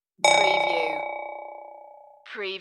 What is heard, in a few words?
Clickie